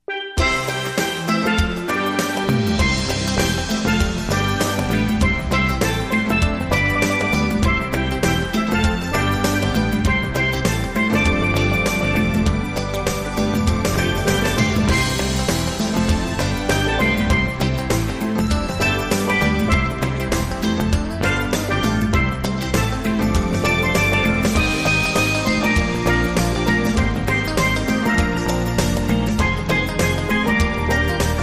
Steel Band Delight

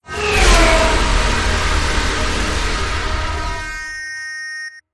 Hit Flyby

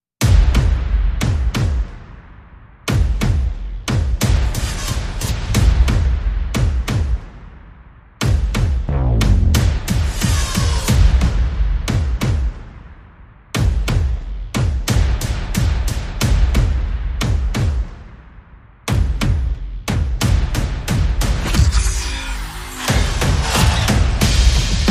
Perc and FX

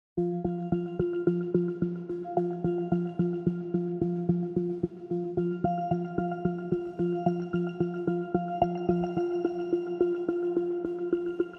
Synth

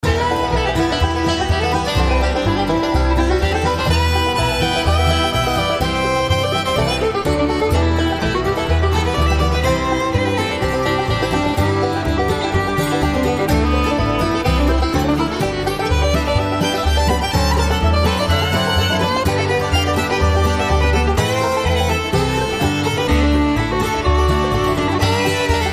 Bluegrass

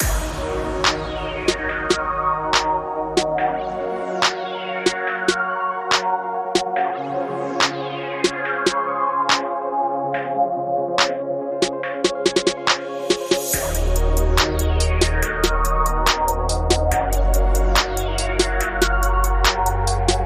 Funky

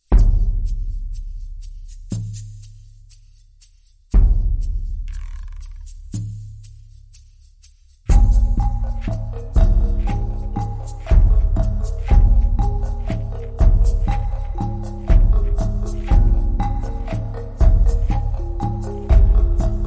Slow Savannah Rhythm

